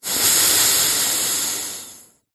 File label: human male breath out close up through nose stuffy; zapsplat